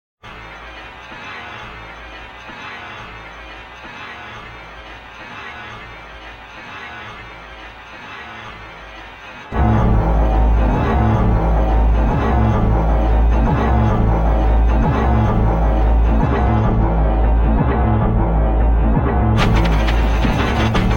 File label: folk